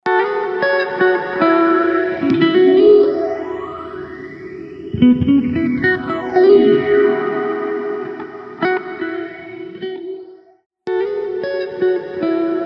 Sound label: Instant Phaser Mk II Electric Guitar; Space Instruments; Eventide